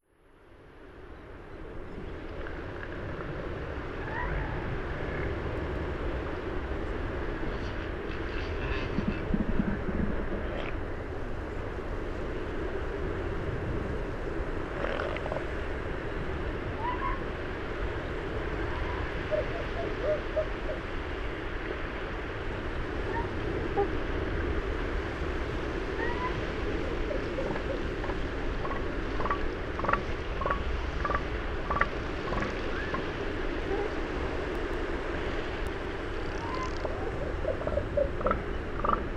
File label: Elephant Seals Point Reyes